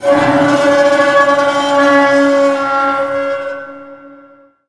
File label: metal; modern warfare 2; amb elements